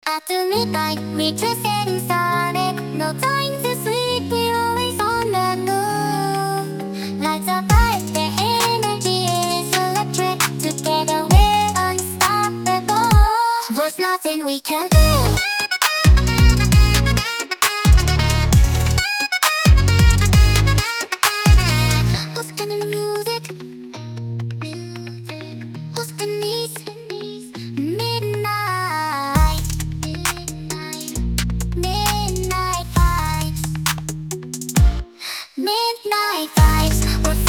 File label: Funkified